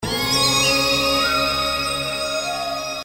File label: outro sfx; Africa Run